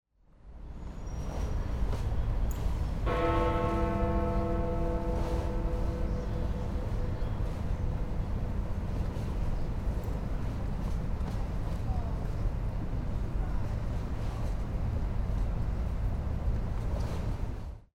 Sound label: thames big ben strikes one